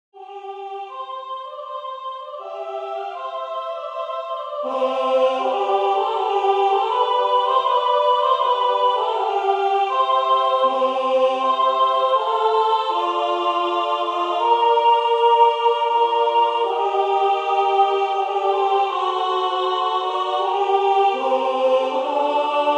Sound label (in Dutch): Welcome to all the Alten en Tenoren Tenor; H.Purcell